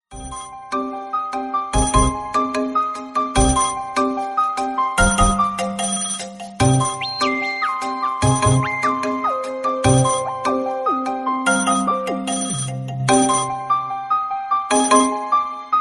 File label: musical ringing call tone; galaxy